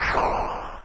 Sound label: Fire Bros Fire Ball; New Super Mario Bros. Wii